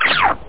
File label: Fish Close, Dolphins; ABDolphins; AB Fish 2 Close